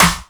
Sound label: original steel snare drum f key; snare drum samples